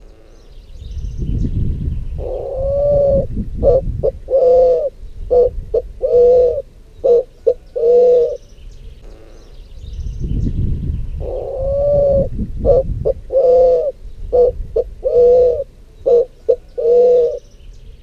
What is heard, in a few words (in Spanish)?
paloma manchada voz; aves